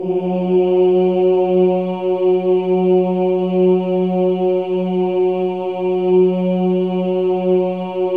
OHS, Symphony of Voices; Spectrasonics

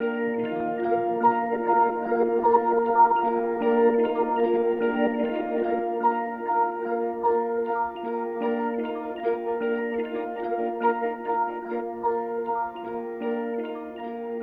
Guitar Textures

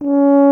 tuba; Miroslav Vitous - Solo Instruments VOL-2

